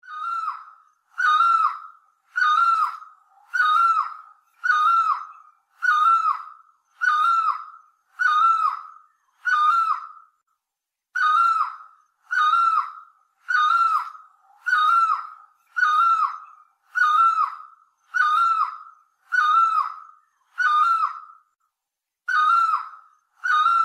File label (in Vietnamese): Tieng chim Cu Roc loai lon